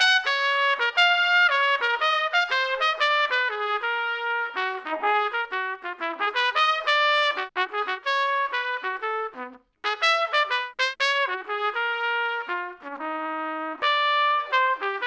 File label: trumpet; Audio Analogies